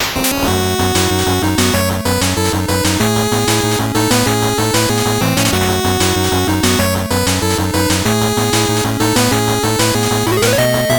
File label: 8bit bgm fast